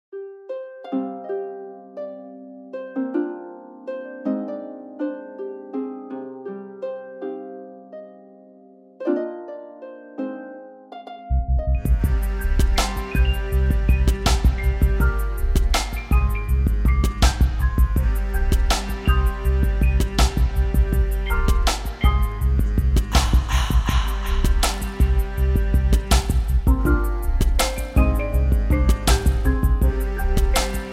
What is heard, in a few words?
instrumental